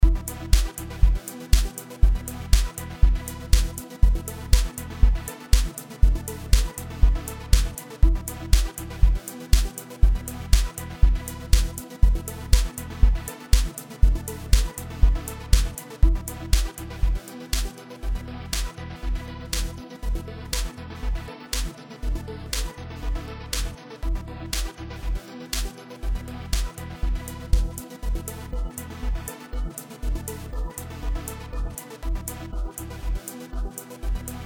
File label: Loop With Drums Removed; AtomicTransient